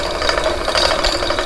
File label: elevator